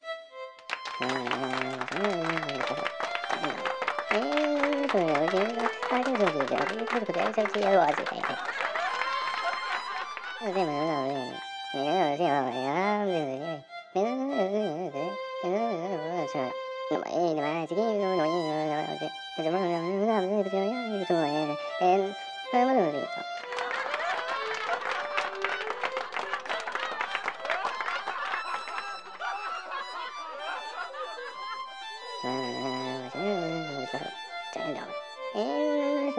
Ambiance; Micro Commandos

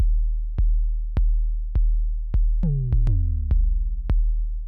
Main Level Intro Drums; Hyperion

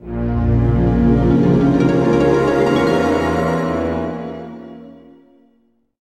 Opening (Stinger); MediEvil; PS1

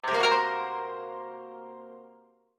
puzzle Solved; B Path of the Teal Lotus

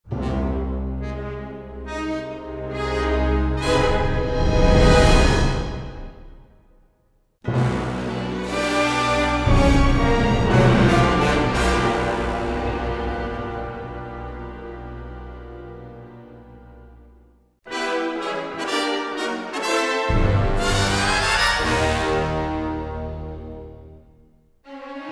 Space Battles Death Stingers; Star Wars Battlefront II

